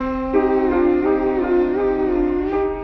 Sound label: piano and voice, Loops, Sad